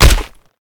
impact; smite; artemis v3 skin15

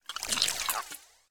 arachne spider egg idle; smite

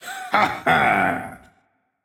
Heh Heh; smite; kukulkan

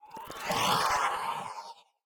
wraith; smite; ahpuch skin10

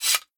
recall sheathe sword; Smite; Susano

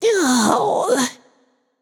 ugh, voicepack; smite; jorm

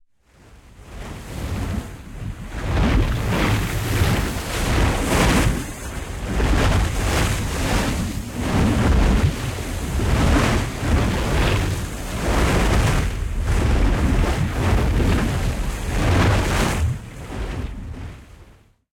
swirl waves; smite; god cthulhu

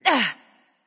NV Ouch; smite; awilix

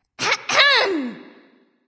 clear throat; smite; scylla

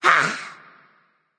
grunt attack; bastet

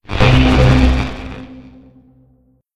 projectile; smite; raijin

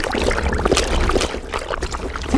Poison; smite; god bakasura

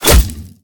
bow hit; smite; god artemis

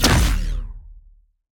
tether explode; smite; osiris

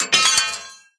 death drop; smite; chronos

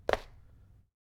Footstep; smite; god susano

